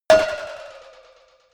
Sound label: cowbell move hard